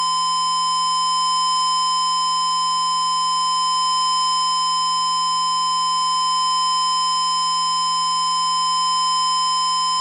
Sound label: Huge signal USB 9300